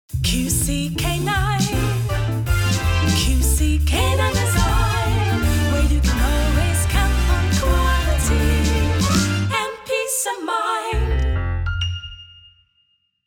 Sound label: canine jingle; qc canine design